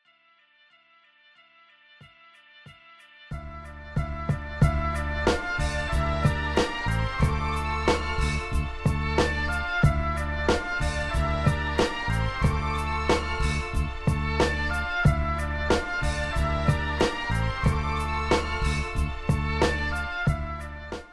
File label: Beats